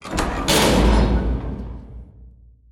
Gate Close; foley

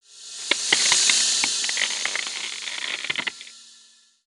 Ice Crackle

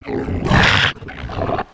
Tentacle Monster Growl; destructibles

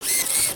Fidget Arm Poke Move; emotes; Overbuild

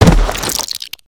wall smashable; avant gardens

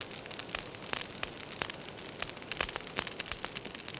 Jungle Campfire; gnarled forest

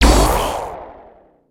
wand hit; weapons; aronwk collection; ndaudio; Repulse Wand

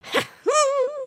breakdance hehooooo; emotes

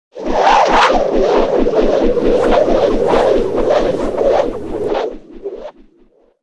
Jail Cage Spinning; gnarled forest

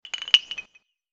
Bamboo windchimes; ninjago

